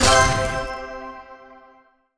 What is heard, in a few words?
sparks smallwin; netent